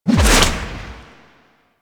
Melee Heavy Attack Whip Whoosh; Warframe; Weapons; Whooshes; Heavy Attacks

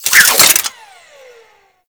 Whip Retract; Grineer

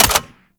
Sniper Mech React; DE; Warframe; Frumentarius; Exalted Sniper

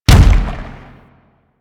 Biped Step; Warframe; Man In The Wall

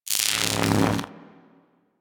Infested Arc Rifle Fire Random B; Warframe; Weapons

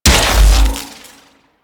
shock rifle shot; Warframe; Weapons; Corpus

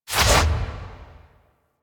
Melee Block Attack; Warframe; Weapons; Whooshes; Blocks

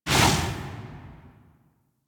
Operator Focus Cloak Blind Burst; Warframe; Operators; Powers; Attack

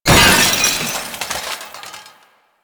Loot Crate Small; Warframe; Entrati

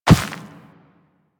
Walk Dirt, footsteps; Warframe; Operator

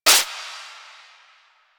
Snake Game Error; Warframe; Nineteeen Ninety Nine

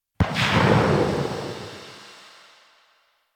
Saw Blade Launcher Tail; Warframe

